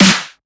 SnareClap; FLPRO

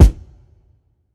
Kick

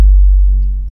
Sub BD

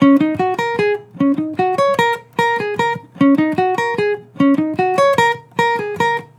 Acoustic Melody Loop 150 BPM F# Maj; Cymatics

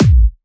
Kick; FLPRO

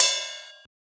Ride; FLPRO

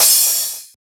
Crash

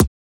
Transient; FLPRO

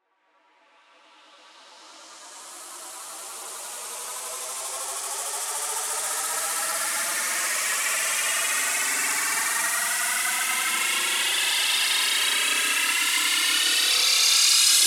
falling 8 bar, Reverse

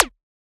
Perc, Percussion; FLPRO

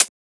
Closed Hat

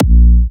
Kicks, Big Room Kicks, A#4

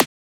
Transient; FLPRO

